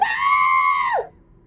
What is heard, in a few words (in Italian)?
prepotente scream